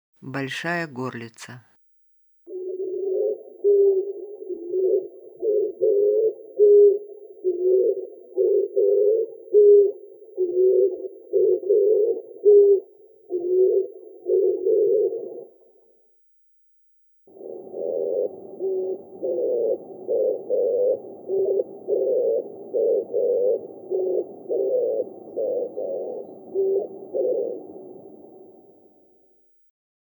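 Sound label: oriental turtle dove voice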